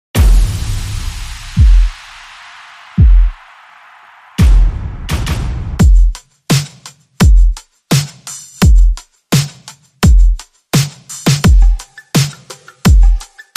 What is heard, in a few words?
Drums Hits and SFX Only